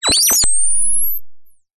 science fiction night vision goggles or equipment switch on high pitched whine; zapsplat